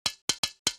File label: Fidget Arm Pokes; emotes; Overbuild